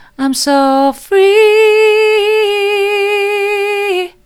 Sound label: soft, vocal